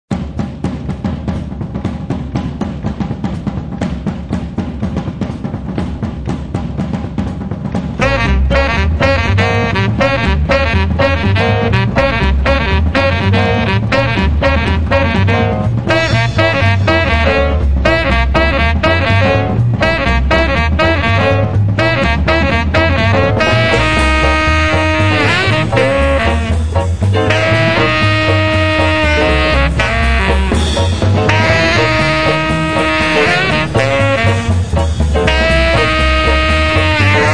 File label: Stompin